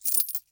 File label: Coins Poured Out; Miscellaneous; 1001 Sound Effects